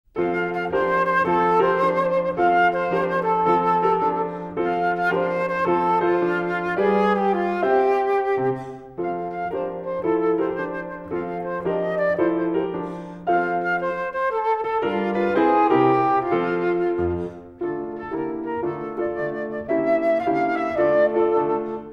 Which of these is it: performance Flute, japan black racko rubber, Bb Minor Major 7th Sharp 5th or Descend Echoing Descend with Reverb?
performance Flute